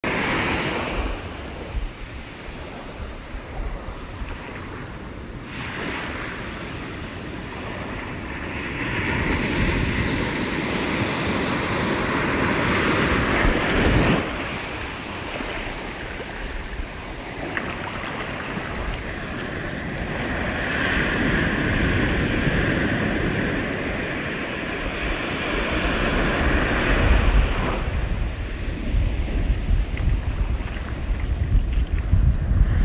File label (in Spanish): PAISAJE SONORO MAR NOCTURNO